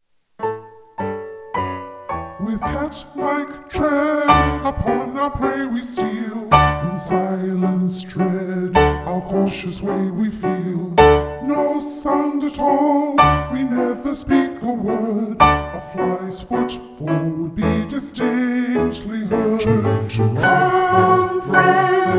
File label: SATB choir; Cat Like Tread Poor Wandring Ones